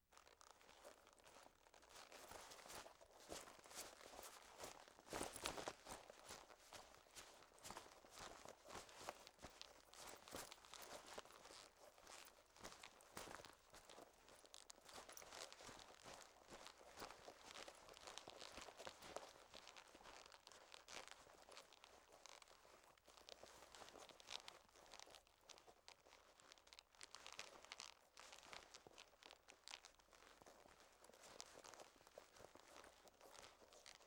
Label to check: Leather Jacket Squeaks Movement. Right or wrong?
Right